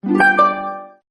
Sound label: samsung galaxy on5 notification music